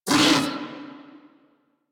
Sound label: Amored Vocal Pain; Enemies; Armored; Warframe; Kubrow